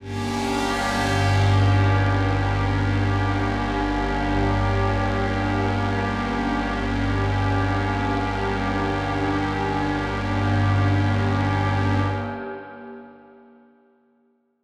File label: One Shot Drone G, dystopian drone; One-Shot Drones